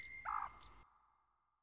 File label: Rock bird; ImpGal2; colony